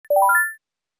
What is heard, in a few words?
return sound